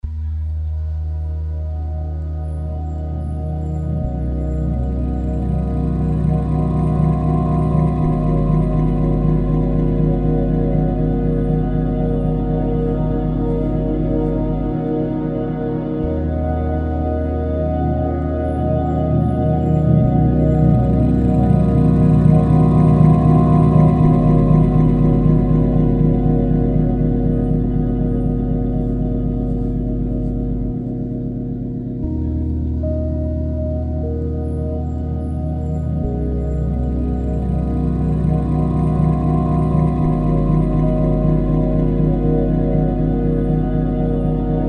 landing jet

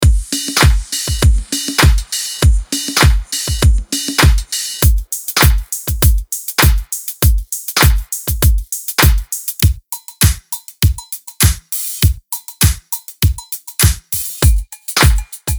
pool beats

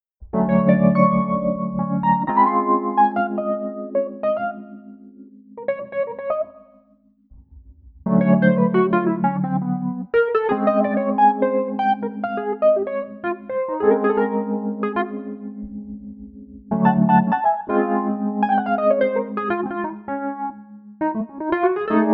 chorus no amp

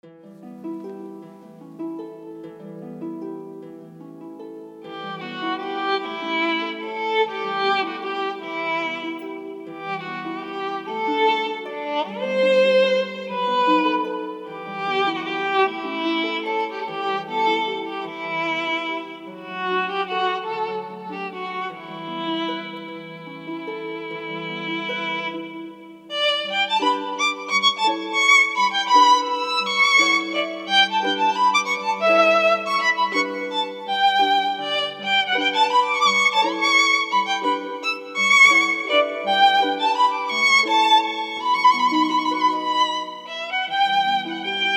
violin

